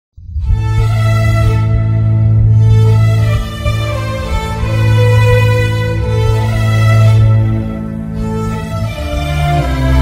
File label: heart breaking love music bgm